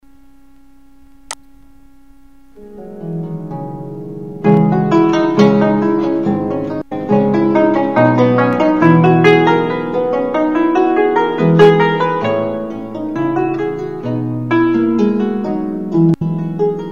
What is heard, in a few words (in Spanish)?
arpa de dos ordenes; La dama le demanda; Cabezón; Galatea II